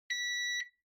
Air Conditioner Beep

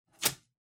Masking Tape (Peel Off)

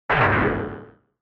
Retro Anime Robot Short

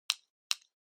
Stapler Click

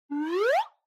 Slide Whistle Mid Up

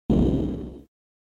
Arcade Action (Explosion)

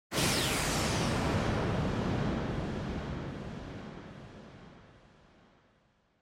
Motion Speed Lines Dry; モーション スピード線02-1(ドライ)

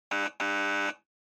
Quiz Buzzer Multi

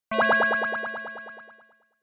Accent

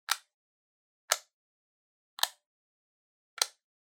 Outlet Timer Pin Pull; コンセントタイマー02-10(ピン 引く); タイマー機器

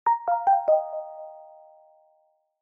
Smartphone UI Sound Dry

